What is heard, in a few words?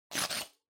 Blowout Close